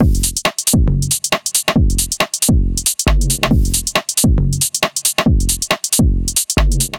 Beat 137 bpm; Ableton; Factory Packs; Skitter and Step; Night Tube

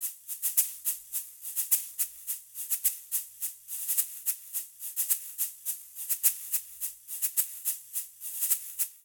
Charanga Cha cha cha Shaker 106bpm; Latin Percussion; Ableton; Factory Packs

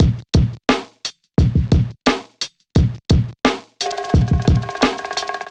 Always Yours Kit 87 bpm, Hip-Hop Drums; MIDI Clips; Golden Era; Sound Oracle

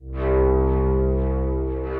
More Buzzin Harp; Instruments; Distressed Strings; Ableton; Mood Reel

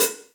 Hihat Foot Deep Snare Dynamics, Drum Hits; Standard Hits; Ableton; Factory Packs; Session Drums Club

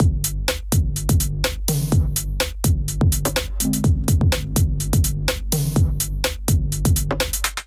Kit Melodic 125 bpm, Drums, Complex; MIDI Clips; Fear Pressure Kit; Ableton; Factory Packs; Skitter and Step

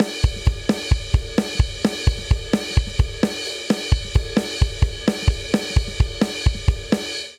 Driving Rock 07 Outro 130 bpm, drum; Ableton; Factory Packs; Drum Booth

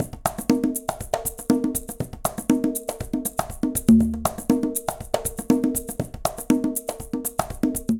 Jazz Funk A 120bpm, Latin Percussion; Ableton; Factory Packs

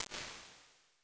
bright room, IR samples; Convolution; NI Kontakt 5

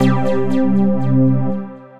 Synth Lead; Semi Tune Stadium; Ableton; Factory Packs; Build and Drop

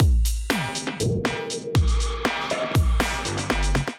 Kit, drums; Matter Kit; Ableton; Skitter and Step

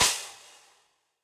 Crash 18 Classic Muffle, Cymbal; Drums; Drum Hits; Standard Hits; Session Drums Studio

